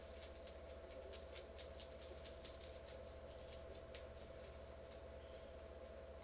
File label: Dog scratch